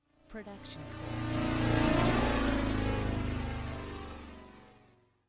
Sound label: slow fly by